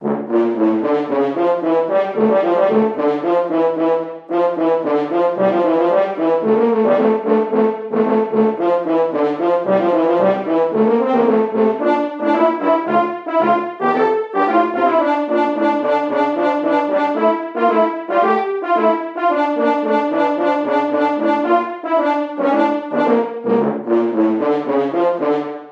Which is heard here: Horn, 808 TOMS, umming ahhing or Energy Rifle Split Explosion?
Horn